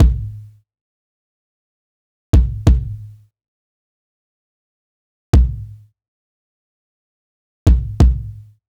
Jazz 90bpm Kick

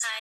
Chants

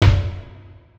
Percs

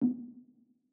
Low Tom; Percs

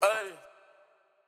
Vox Chant

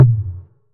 Percussion

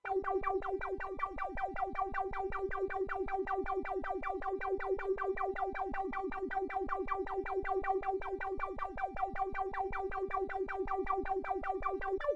Stabz

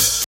Closed Hats

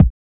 Kicks

